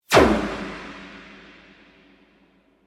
ENERGY WEAPON DISCHARGE, Created energy weapon effects; Machinery Sound Effects